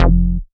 Synth Bass; Vengeance Samples; VEE Synth Shots

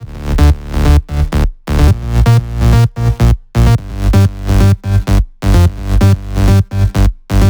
128BPM Melody; Vengeance Samples; Unimatrix Kit

